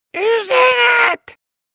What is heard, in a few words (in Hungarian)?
uzenet; csengőhang